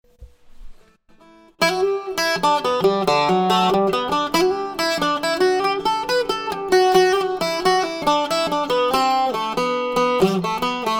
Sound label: bouzouki